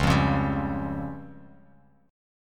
C sharp Major Flat 5th, Bass Standard; C#Mb5 strummed; JGuitar

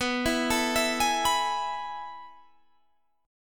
A Major 7th Suspended 2nd B

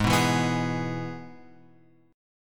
G sharp Minor Sharp 5th, guitar; G#m#5; {4 2 2 4 X 4} strummed

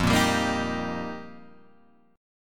F 7th Suspended 2nd Suspended 4th, Guitar Open G

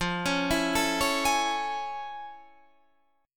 A Major 7th F; AM7/F; arpeggiated

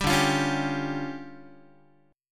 D Minor Major 7th Double Flat 5th, D, Guitar

